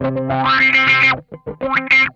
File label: GRAINY WAH, Funk Guitar; Zero G - Funk Guitar